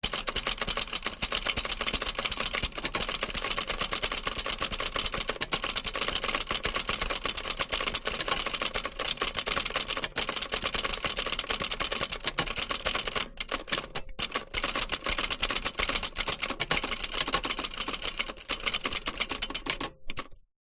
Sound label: office typewriter typing fast rear close vintage 1960; lemair helvetia